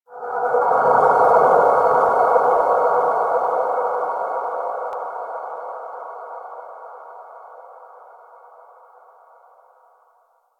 Texture Distant, Ambience; Warframe; Cephalon Weave; DE

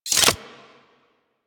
Crossbow Reload Insert Clip, weapons; Garuda

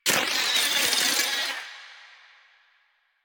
Hi Tech Clicks; Cave; Ambience; Warframe; Stalker Cave

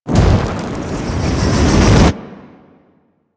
Fire Vent Charge Up; Warframe; Ambience; Corpus Gas City; Gameplay Remaster

